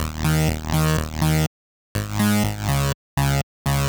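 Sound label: 123 bpm italo disco synth, combi loops; Nu Dance Electro; Zero-G; Soundclash; Acidized Wavs; Italidisco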